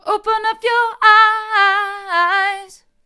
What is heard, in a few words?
hard, vocal